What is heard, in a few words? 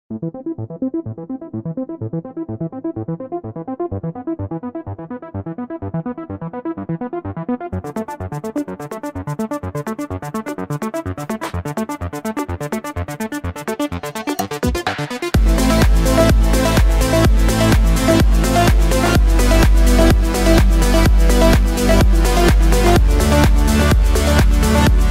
electronica